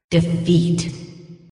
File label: defeat; lol